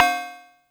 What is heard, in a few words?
Cowbell FX